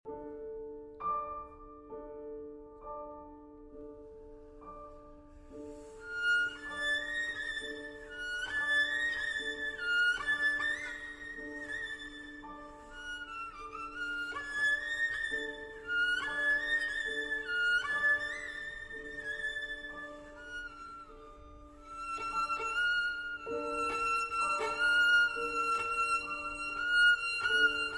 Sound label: Romanian Folk Dances; Six Romanian Folk Dances, BB 68, Sz. 56; In One Spot; Arr. Székely Transcr. Maisky